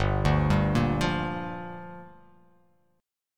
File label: G Minor 7th